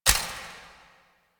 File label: Countdown Tick; UI; Undercroft; Warframe; Duviri